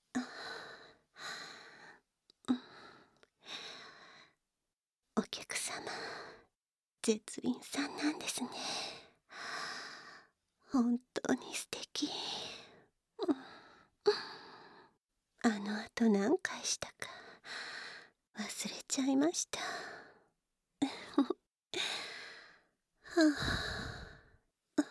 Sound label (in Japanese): 耳舐めアフターサービス 簡易モノラル編集 効果音なし